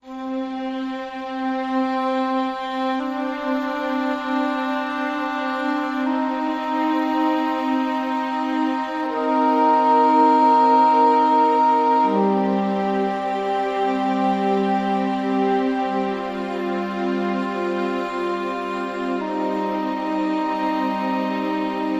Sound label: Strings & Woodwinds